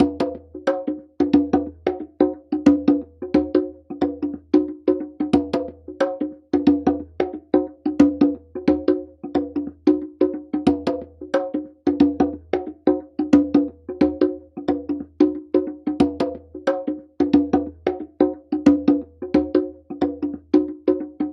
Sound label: Hip Hop Conga 90 BPM; Перкуссии (Percussion Loops)